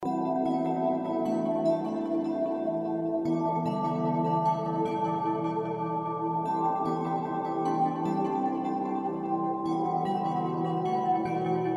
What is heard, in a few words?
NIGHT BPM 149